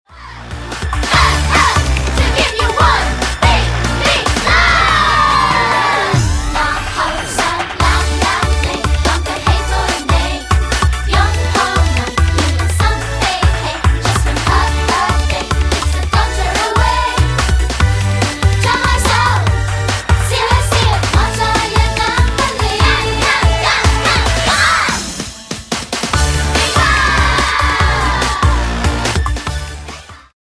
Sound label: Karaoke